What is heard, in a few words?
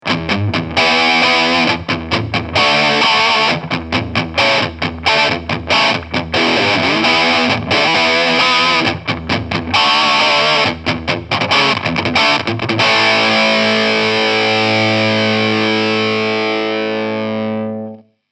Mesa Boogie Fillmore 50 Combo Drive